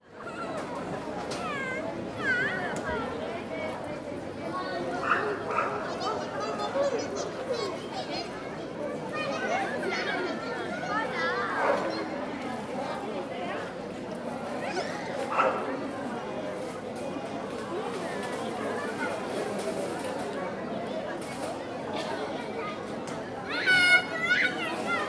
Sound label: Square